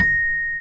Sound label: Celeste A#4